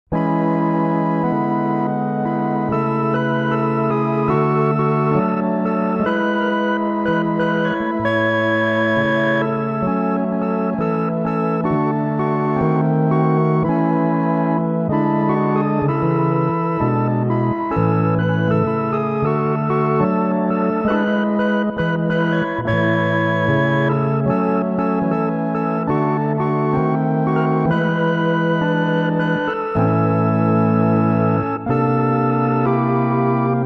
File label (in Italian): solo musica